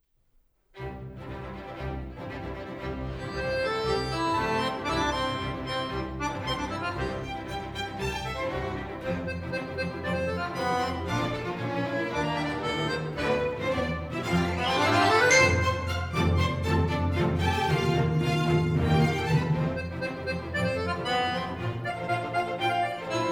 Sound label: Accordian Concerto